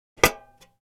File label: Metal trash can lid closing